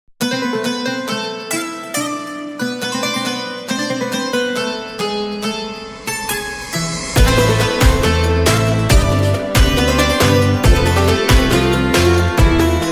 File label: Ramadan background music